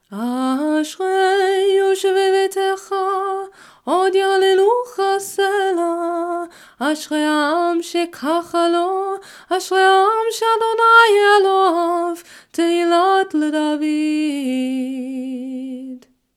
Ashrei opening minor nusach; weekday minchah

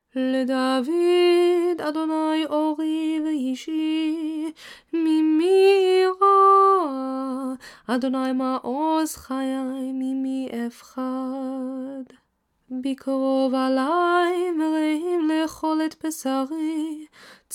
Psalm 27 opening nusach then melody; special prayers

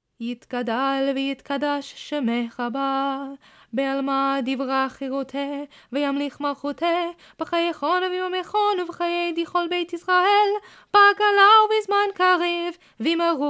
Weekday Maariv Chatzi Kaddish nusach

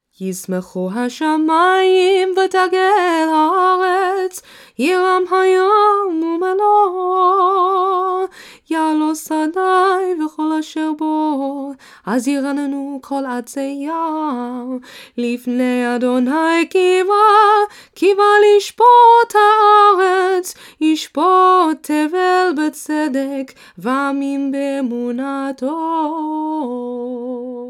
Psalm 96 Closing Nusach; kabbalat shabbat

